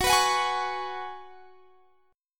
Gb Suspended 2nd Suspended 4th; strummed

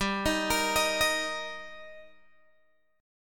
G Minor Sharp 5th G Guitar DADGAD, chord

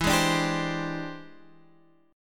E 7th Sharp 9th Sharp 5th, chord

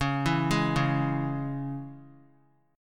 C sharp Minor, chord; C#m; arpeggiated; JGuitar

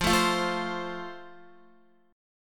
F Major, Guitar; strummed

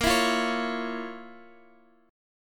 F Major 7th Suspended 4th Sharp 5th Bb

